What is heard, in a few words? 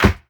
short acoustic snare f key; snare drum samples